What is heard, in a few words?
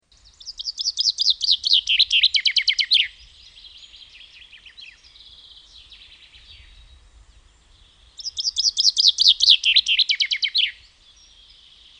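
Brambling; Madarak; Erdei pinty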